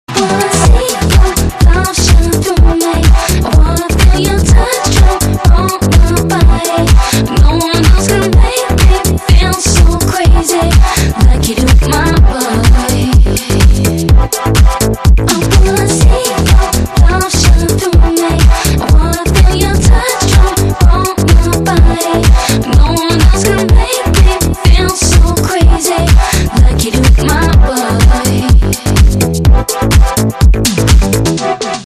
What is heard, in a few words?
melody